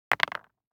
DICE on Neoprene, Throw and Roll, Mini, 2 Two Dice; Samples; ArticulatedSounds; DICE Sfx Party (Extended)